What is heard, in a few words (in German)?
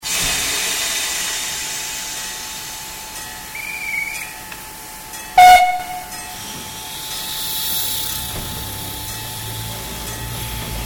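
Dampflok fährt ab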